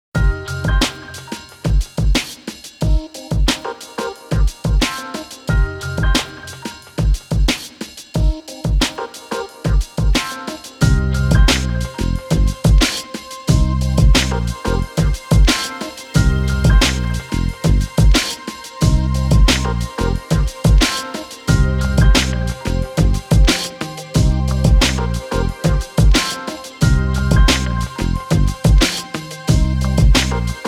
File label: Chill Space